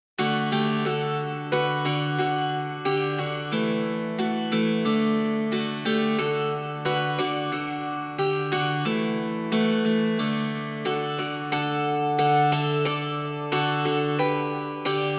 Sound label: dulcimer; mountain dulcimer; Embertone